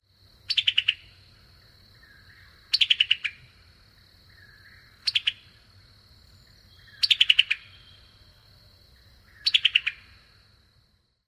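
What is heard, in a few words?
SUMTAN chatter calls, summer tanager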